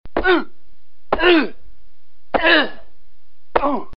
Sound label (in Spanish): golpe pelea